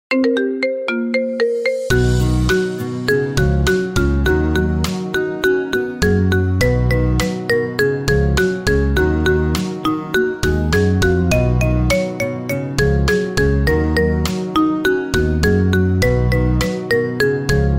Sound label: last night marimba